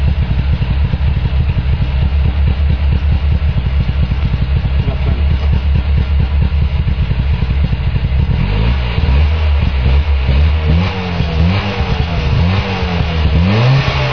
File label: trabi porsche